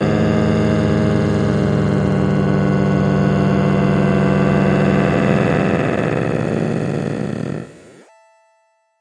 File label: fifth stretched dry; REMIXABLE COLLECTIONS; Ze Franks Pain Pack; pain pack sweeps and fx